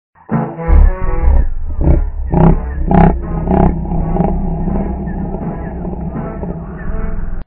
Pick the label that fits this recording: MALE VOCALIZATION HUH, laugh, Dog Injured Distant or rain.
laugh